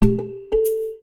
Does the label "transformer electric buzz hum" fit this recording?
No